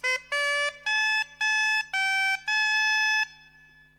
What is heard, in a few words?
Bombarde